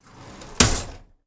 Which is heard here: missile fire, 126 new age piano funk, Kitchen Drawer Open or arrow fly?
Kitchen Drawer Open